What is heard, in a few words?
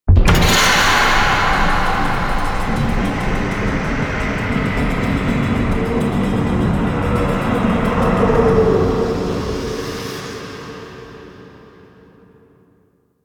Sacrifice Chamber Door Open; Warframe